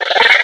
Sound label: box monster chitter